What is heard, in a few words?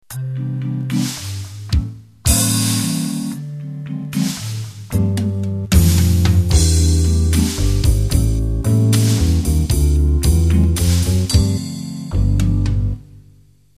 Jazz Vox Intro